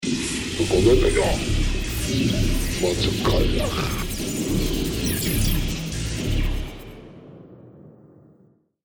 Space Battle Alien Commander On Bridge, spacecraft sound effects